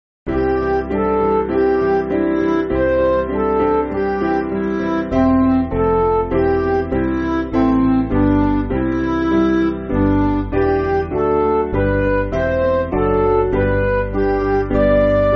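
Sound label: Music Backing Files for Churches; We Are Living; The Alarm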